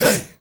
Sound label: HEY HEY